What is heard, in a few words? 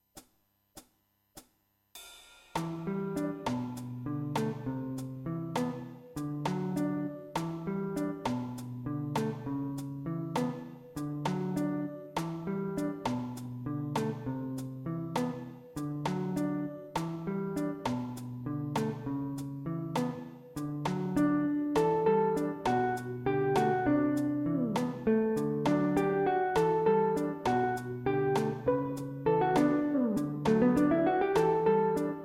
Twin Guitar Ballad